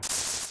Open Curtain; Open Zelda 6.2.4; Quest Designer 2.0.4

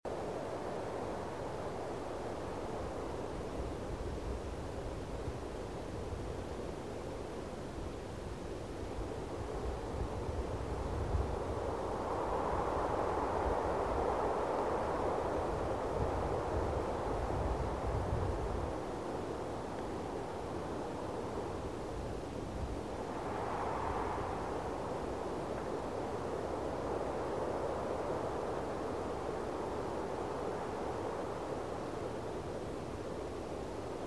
Night Desert Ambience Quad; Journey

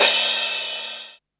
Crash, Cymbal; Ableton Live 12 Lite; Core Library; Drums

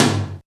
Tom R100 Clean, Drums

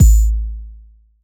Kick; Ableton Live 12 Lite; Core Library; Drums

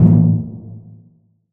Timpani Soft Vinyl 60s; Drums; Misc Percussion

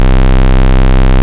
OB SQ, Synths; Ableton Live 12 Lite; Core Library

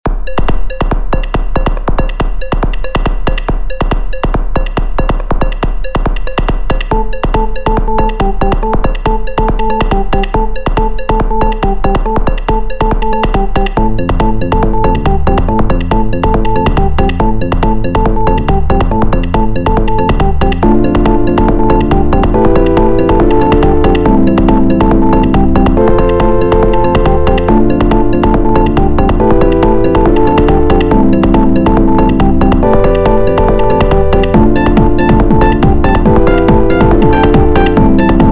minigame

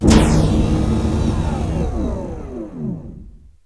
Door Open; Genesis3D

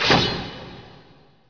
AXE; AngelTearsv3; AndromedaSky

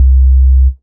Bass Sine C1

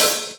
Hihat Open

